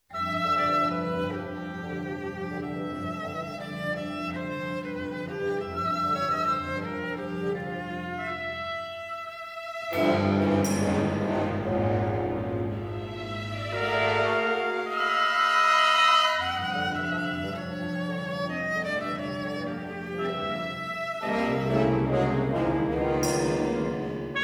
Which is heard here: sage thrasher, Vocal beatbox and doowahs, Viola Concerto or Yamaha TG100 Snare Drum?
Viola Concerto